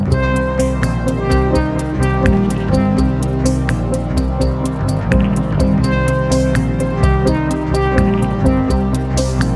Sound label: Electro Radius